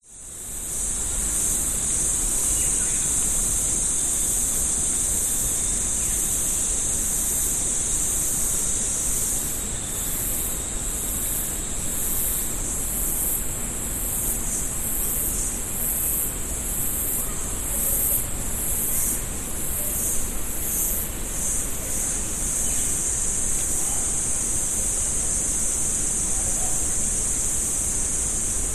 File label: forest route birds insects wind trees few people distance summer australia; nature